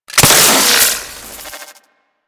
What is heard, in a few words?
Branding Bolt Destruction; Warframe; Tutorial